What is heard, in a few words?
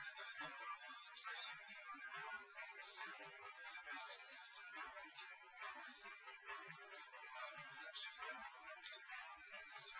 wdrums; liverec 250ms 5cm; SiSEC08; SiSEC underdetermined